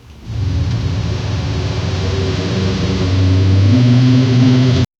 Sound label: roaring engine screeching, cars